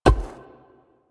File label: Grenade Land; Warframe; Vehicles; NechroMech; Abilities